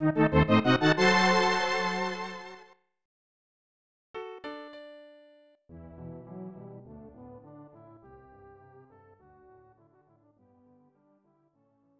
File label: Tada; Heisei Shin Onigashima; snes